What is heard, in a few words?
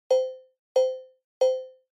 Cowbell Synth